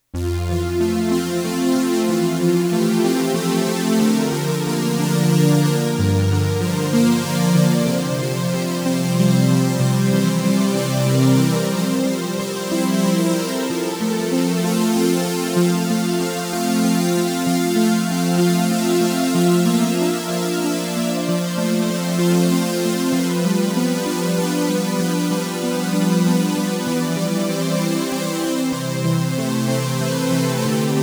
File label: Requim Sine Wave